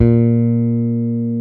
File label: P BASS A#3, pick bass